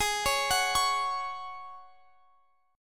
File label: G sharp 7th Suspended 4th; arpeggiated; x,x,18,18,19,21; G#7sus4